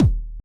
kick, Roland MC-909

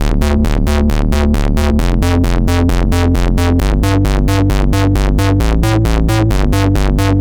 C64 Bass, Commodore 64, Chiptune

